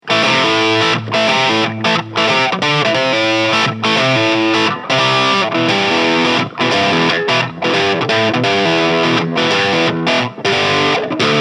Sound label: Crunch; Solar Guitars T1.7AD Aged Natural